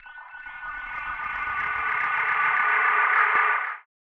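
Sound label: sci fi ambiance; soundscrate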